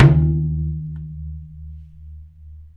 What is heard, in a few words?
dwooong, percussive